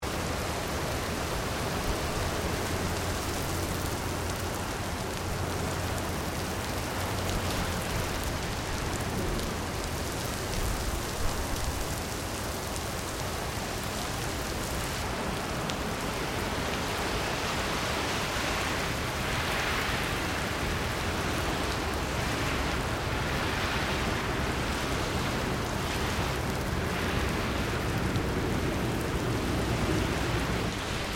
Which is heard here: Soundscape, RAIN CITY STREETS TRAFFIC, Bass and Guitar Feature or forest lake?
RAIN CITY STREETS TRAFFIC